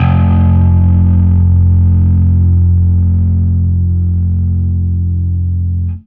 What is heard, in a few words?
Fuzz F1; Torchlight III; PS4